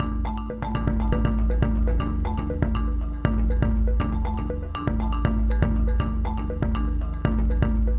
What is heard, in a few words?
Minimalism, Processed Percussion